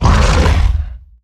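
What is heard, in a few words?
Stealth Attack; Evolve; Behemoth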